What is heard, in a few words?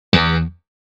Electric guitar heavy pluck